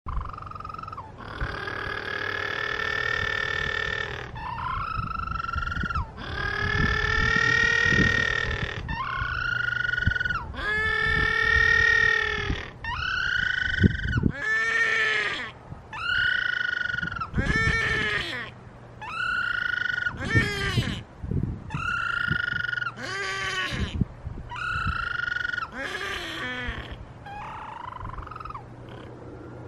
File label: Penguins Braying Sulphur Creek